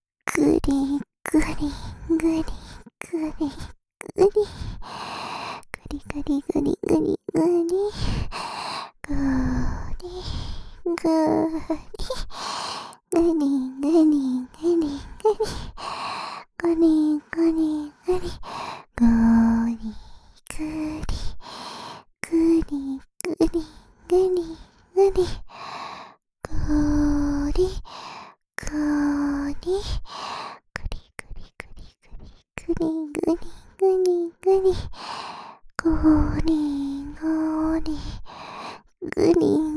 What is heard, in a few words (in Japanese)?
ぐりぐり…♪, ASMR, 右耳